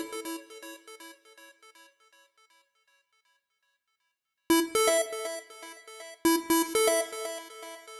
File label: Mono Synth, 120bpm D; Zero-G; Nu Disco; Happy People